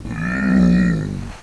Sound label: zombie heal heavy; nst zombie